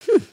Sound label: bored hmmph; emotes